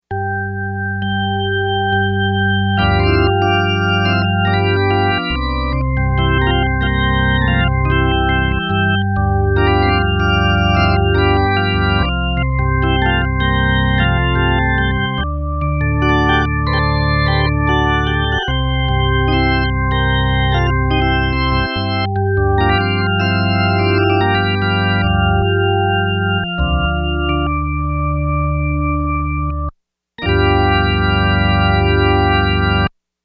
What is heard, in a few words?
Hammond